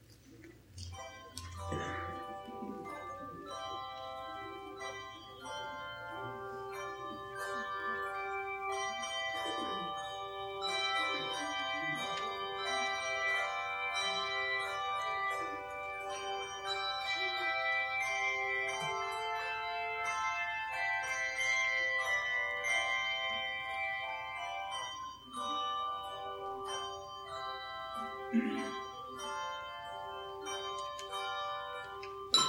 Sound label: bell choir